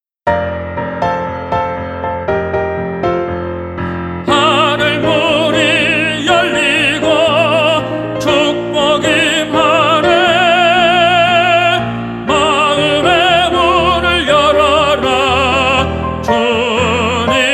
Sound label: TENOR